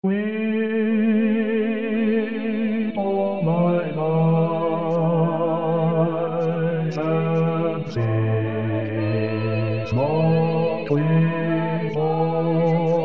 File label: basse weep o mine eyes